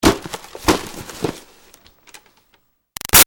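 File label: Foley Box Hit Christmas Present Crash; Crinkle; Hits; Xmas; Objects; Big Room Sound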